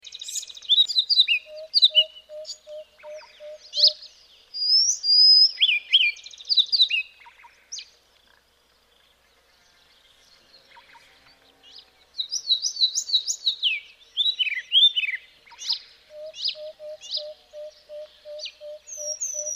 karoo scrub robin

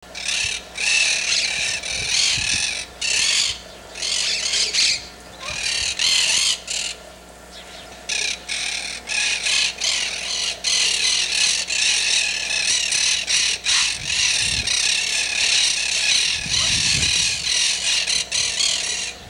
monk parakeet

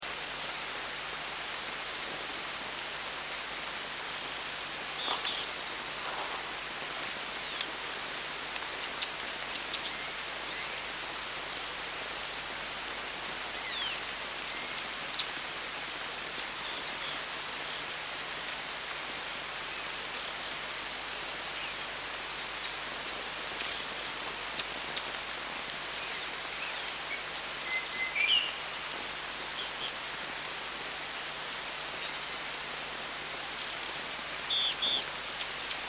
sulphur billed nuthatch

